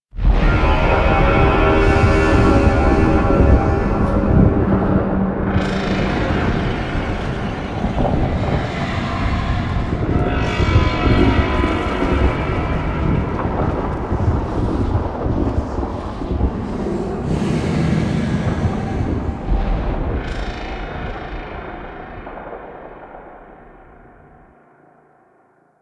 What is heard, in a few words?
Take Off And Shift; Murex; Warframe; Quests; The New War